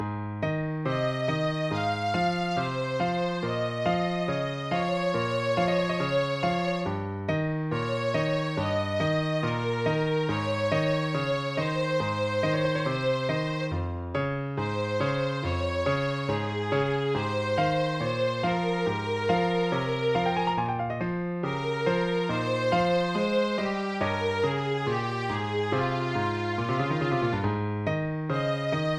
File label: christmas piano; ringtones